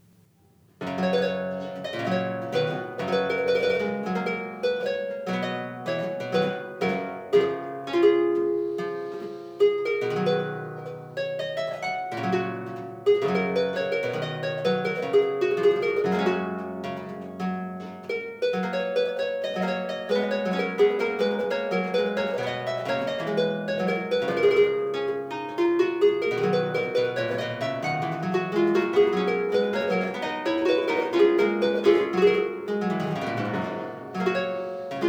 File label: harpsichord; Sweelinck - Unter der Linden Grune (Back 8'); Sorli Harpsichords